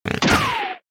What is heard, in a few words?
Shoot; Aporkalypse Pigs of Doom